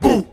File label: "boo" group of men; ooh ahh